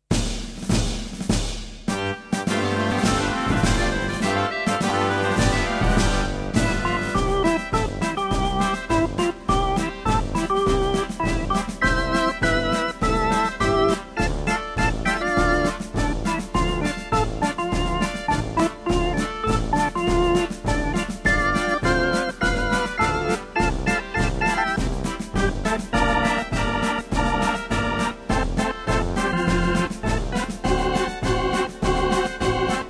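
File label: orgues Hammond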